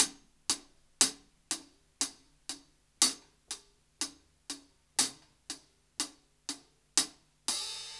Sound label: Ballad Loop, Drum, 70s Ballad; Drum Tools